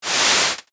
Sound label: underline marker swipe